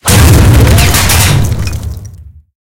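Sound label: tank hit high-explosive mid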